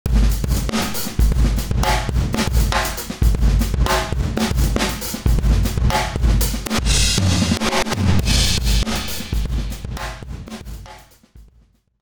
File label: over comp groove; toontrack